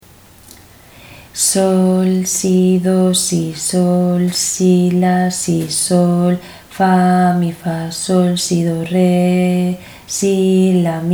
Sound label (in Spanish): ritmo 6-4